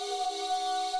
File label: Loop Puter